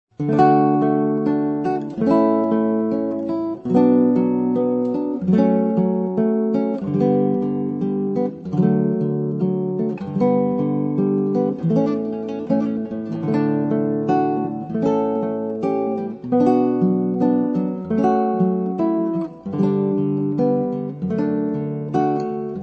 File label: Solo Guitar